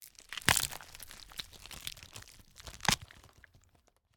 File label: Heavy Body Crunch; 344 Audio; Flesh, Bones & Gore